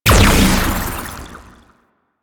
Plasmor Shot, Shotgun; Warframe; Weapons; Corpus; Arca Plasmor

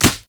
Melee Fist Impact Generic; Fists

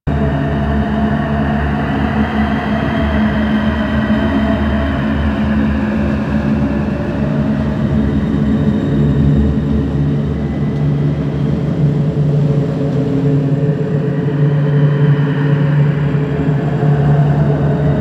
Ice Interior Exposed Amb; Warframe; Corpus; Ice Planet

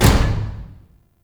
Service Door Close; Con Junction; Warframe; Entrati; Ambience

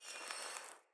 Staff Caster Charge Heavy Start; Weapons; Player; Staves; Starter

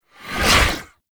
Impiety Riser; Warframe; Dagath

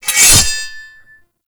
Machete Sheath, Weapons Melee; Warframe; Kahl Machete

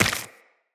Run Flesh, Footsteps; Tenno

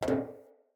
Walk Metal, Footsteps; Characters; Enemy; Mockeries; Mockery

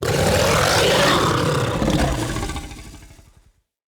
Kubrow Feral Vocal Angry

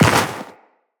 Walk Snow, Footsteps; Tenno

